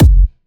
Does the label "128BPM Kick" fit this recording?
Yes